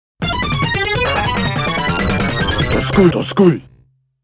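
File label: Game Over (arcade)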